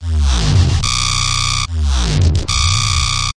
dubstep alarm bass; Basses

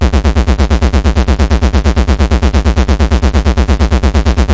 thrust; sound effects and noises; video games; tacscan

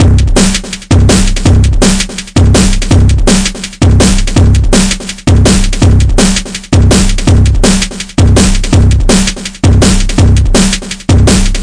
165 kettle breaker, drum loops and breaks; 161 to 180 bpm

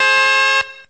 siren hit; Weird and Interesting Hits

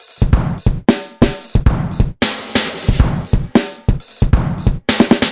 180 offbeat rolling; 161 to 180 bpm

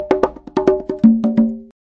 090 bongos, 090 bpm; Bazillions of Bongos

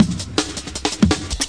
161 fierce joy, drum loop; 161 to 180 bpm

